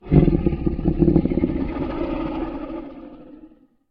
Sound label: Gold Dragon bumpy long slow; SyrinScape; Vault of Dragons